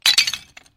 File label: Damage Crack; Cuphead